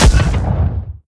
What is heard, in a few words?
punch; smite; cabrakan